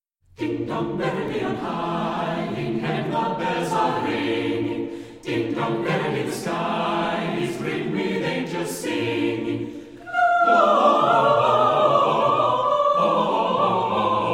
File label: Ding Dong Merrily On High, Chamber Singers